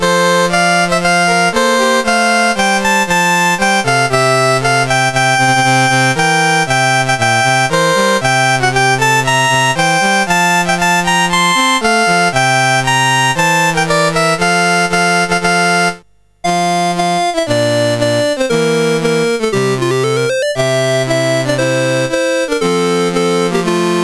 United Arab Emirates, National Anthems, CSG 8580; CSG8580R5

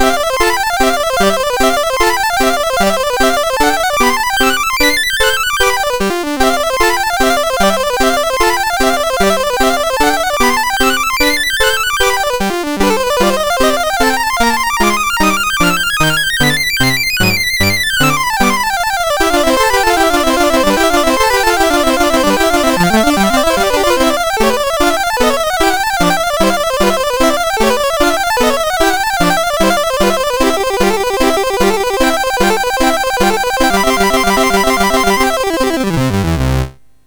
Demonic Study in C Major, MOS6581R4

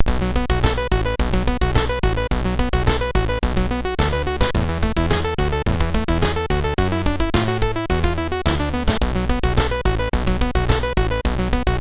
Lights On, MOS6581R3

